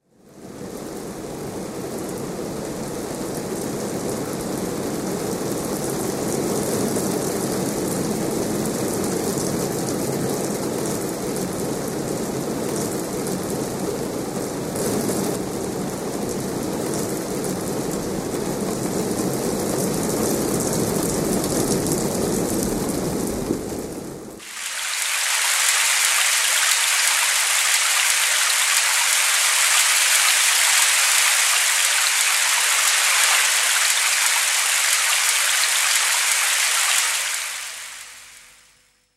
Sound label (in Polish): odglos padajacego deszczu